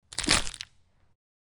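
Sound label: bones crunching, fracture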